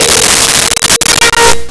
Celeste Bb4; Nintendo; July 2020 Gigaleak

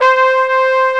Trps Bones, c5; BGM; Nintendo; July 2020 Gigaleak

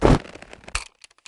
assault rifle melee

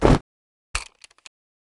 assault rifle melee

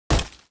heavy dirt, dirt hits

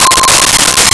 Vibes C6; Nintendo; July 2020 Gigaleak

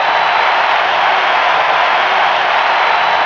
cheer

